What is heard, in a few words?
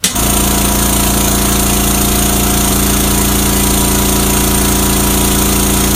riverbarge electric motor; Left 4 Dead 2; Source Engine